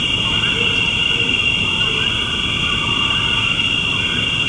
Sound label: loop jungle